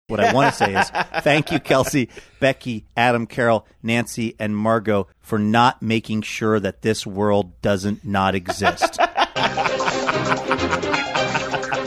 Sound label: having good laugh; saying what i want to say is thank you